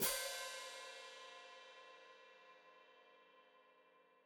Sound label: Hat Closed Open, Hi-Hat